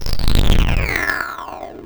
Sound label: vaporizing